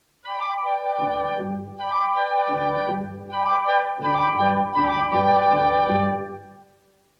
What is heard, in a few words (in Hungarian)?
Petőfi szignal; Petőfi Rádió; a család rádiója; 1993-1994